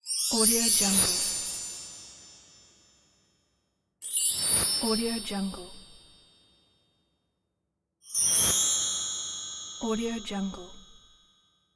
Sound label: magic sparkles